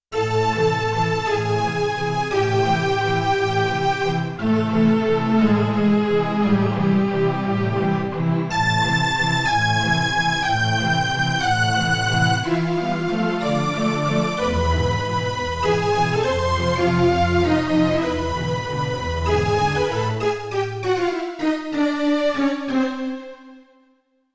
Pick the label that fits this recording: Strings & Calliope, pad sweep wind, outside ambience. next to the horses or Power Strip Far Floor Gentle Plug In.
Strings & Calliope